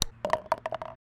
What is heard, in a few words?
Golf Ball